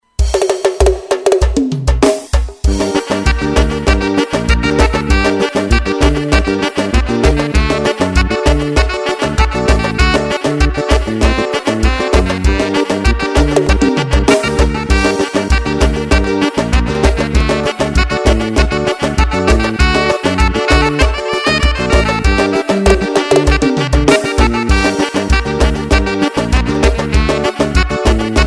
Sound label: solo